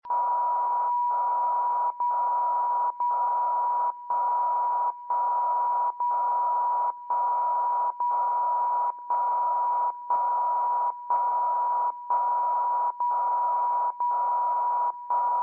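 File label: dcf77 time signal germany 77.5 kHz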